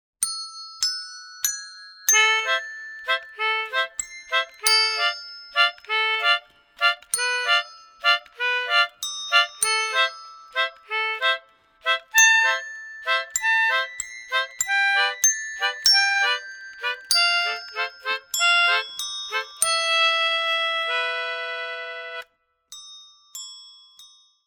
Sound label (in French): air de clochettes